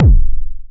Jomox Kick

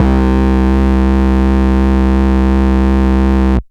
Bass B dist C2, drumnbass 170bpm; musicradar; Bass B Multis

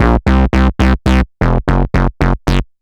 Bass A dist 170 C, drum n bass 170bpm; musicradar; Basslines A

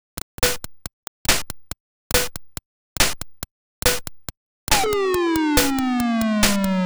Drums, 140 BPM; CHIPSHOP DELUXE; CHIPSHOP LOOPS

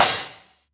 Jomox Cymbal; techno drum fx; Analogue DrumHits

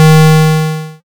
YM Synth D#; ChipShop; CHIPSHOP DELUXE; CHIPSHOP HITS; SYNTHS

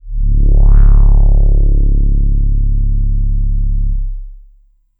Bass Kitten, techno drum fx; Single Hits

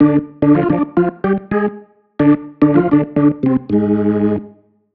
Keys Line 110 D, 110bpm, hiphop; musicradar

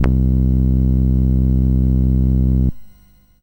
SID Bass C; CHIPSHOP HITS

